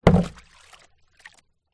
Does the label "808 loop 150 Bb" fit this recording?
No